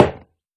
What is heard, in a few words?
Step Metal Refined